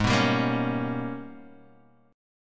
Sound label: Ab Minor Major 11th Ab, Guitar Half Step Down